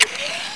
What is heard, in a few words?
tube, srifle close; weapons